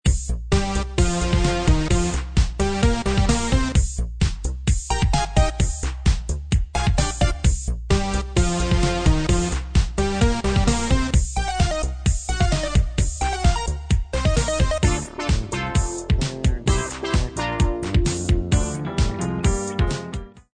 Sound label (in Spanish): 130 sintonias